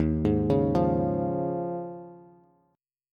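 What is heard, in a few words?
E flat 7th Suspended 2nd Suspended 4th, Bass; arpeggiated; chord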